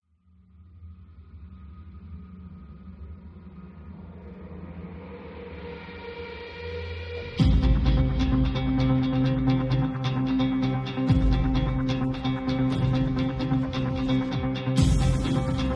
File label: soundtrack, groove